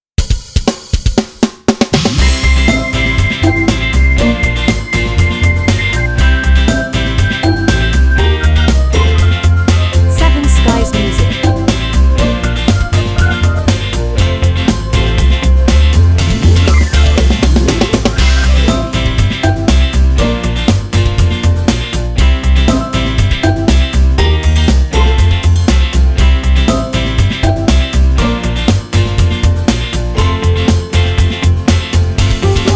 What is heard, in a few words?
Light Comedy